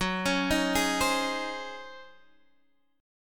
Db Major 7th Suspended 2nd Suspended 4th Gb, chord